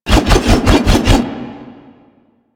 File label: Wolf Axe Throw Loop; Warframe; Grineer